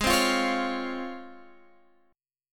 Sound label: Db Major 7th Ab